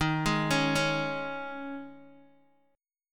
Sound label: D sharp 7th Suspended 4th, Guitar, Open D